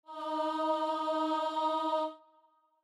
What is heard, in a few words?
starting note, voice; lyrically in eb major